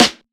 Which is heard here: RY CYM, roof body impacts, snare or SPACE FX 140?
snare